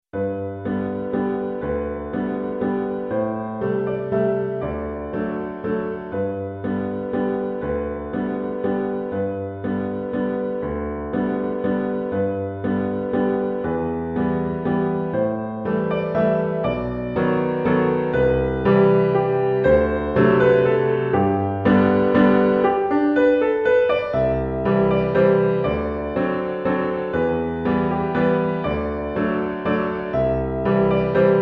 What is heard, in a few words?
Waltz